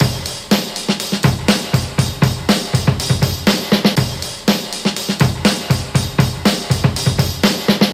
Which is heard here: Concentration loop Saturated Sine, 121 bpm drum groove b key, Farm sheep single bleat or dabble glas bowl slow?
121 bpm drum groove b key